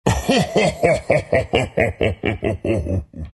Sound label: treant laugh; dota